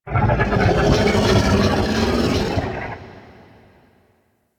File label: Golden Maw move; Warframe; Ambience; The War Within